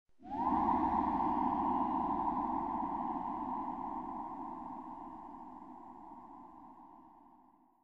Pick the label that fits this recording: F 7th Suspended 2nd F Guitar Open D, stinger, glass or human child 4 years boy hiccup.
stinger